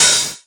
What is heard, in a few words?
hat open high noise classic, Chicago House Drum Hits